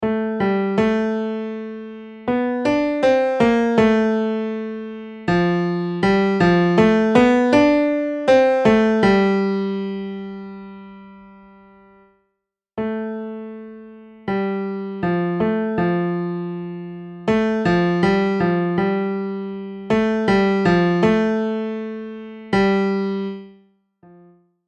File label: melody